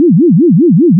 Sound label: Game, 游戏音效; 特酷声音素材库